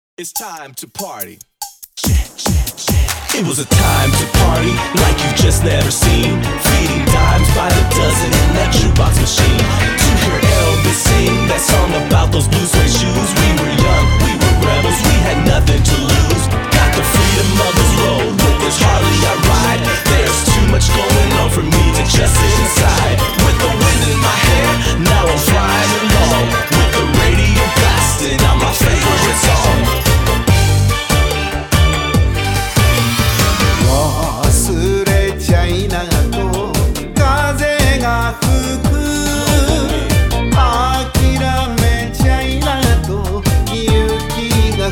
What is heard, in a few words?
rap